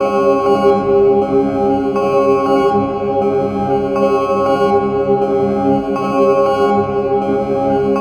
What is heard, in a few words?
Bells Two 120